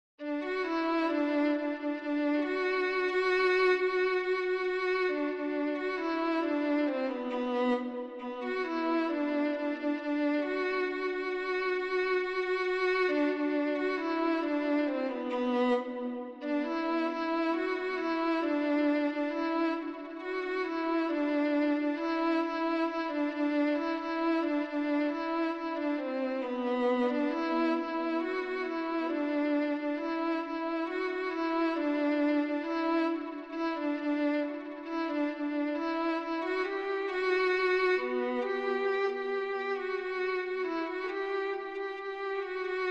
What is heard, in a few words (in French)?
violon